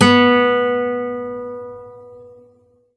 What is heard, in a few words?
string; halflife; dm leveldirector